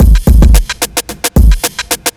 block rock kit

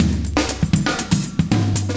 120bpm loops; Sound Effect Library

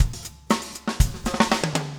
funk, 120bpm, loops

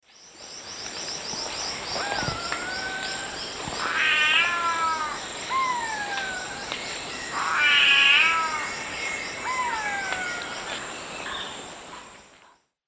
Jacamerops aureus, calls; S. America; Eastern Lowlands

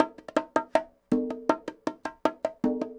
cong bongs 80, latin, 80bpm

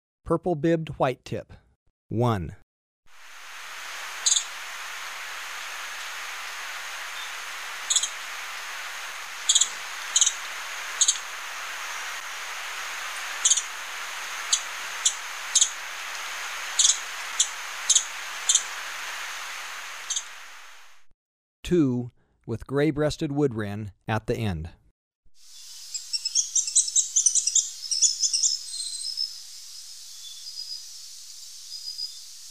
Purple-bibbed Whitetip; S. America

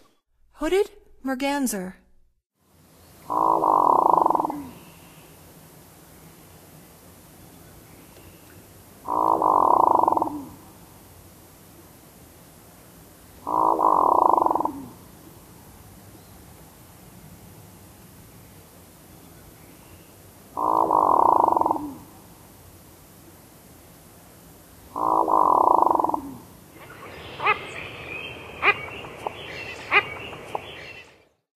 Hooded Merganser; North America; Stokes

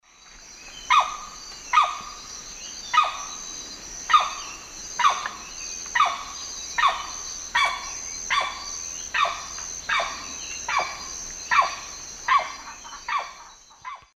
Aramus guarauna, calls; S. America; Eastern Lowlands

